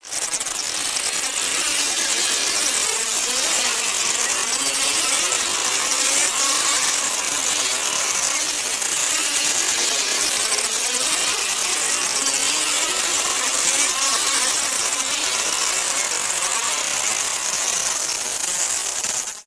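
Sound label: Crunch Munch Attack, body sounds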